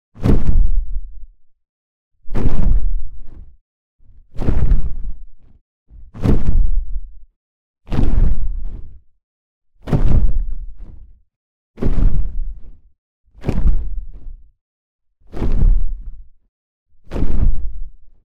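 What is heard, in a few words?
another dragon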